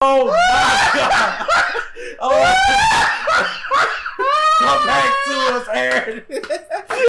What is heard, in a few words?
crying with laughter; taunts; props